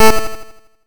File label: Menu Timer tick; itgmania